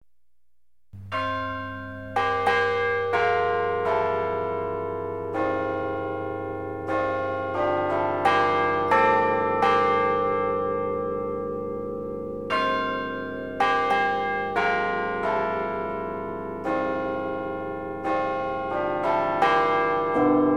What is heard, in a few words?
chimes; berkeley historical society; clinton r. morse; hail to california